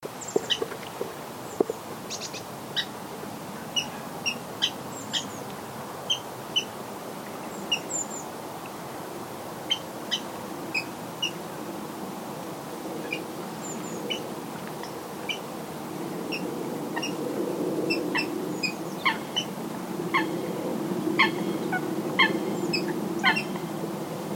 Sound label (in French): rale eau; jablines